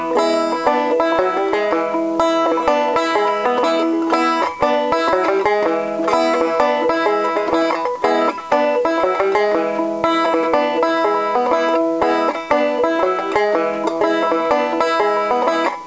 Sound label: Race (Wait Loop)